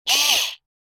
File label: Electric cheese grinder; cooking